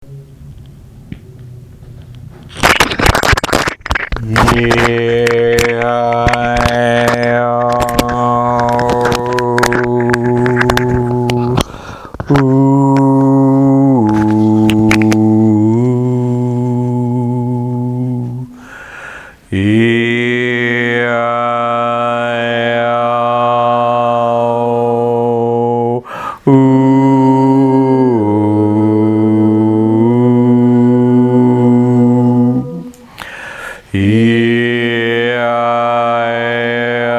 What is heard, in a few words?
chant and meditation